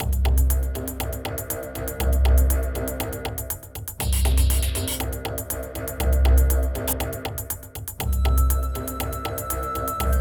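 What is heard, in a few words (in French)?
Une souris verte play-back sans claps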